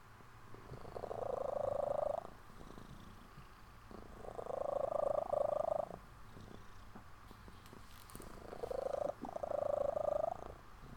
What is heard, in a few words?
Kitten Purrs; Rated G